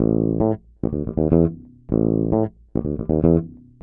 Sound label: DEEPHOUSE BASS 125 A